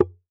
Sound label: Djembe - F#; percussion; Cymatics - Infinity Beta Pack 2.0; Zeus Percussion Collection